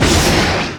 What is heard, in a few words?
Rocket Launcher Shot; SFX Kit; Weapons